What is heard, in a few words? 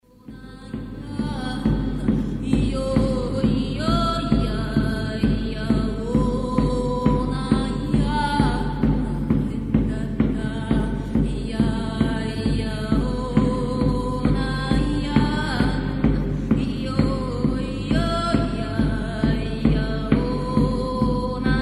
Ritual Song